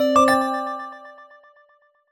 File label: jingle chime positive